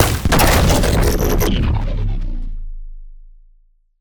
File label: Misc Sci-Fi Explosion